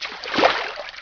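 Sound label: in water; dmc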